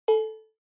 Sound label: Cowbell Synth